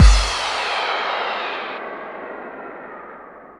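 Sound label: bass drum FX, dance drums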